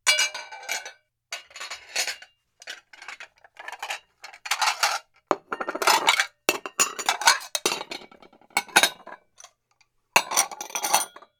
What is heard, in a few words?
Dishware Cleaning Table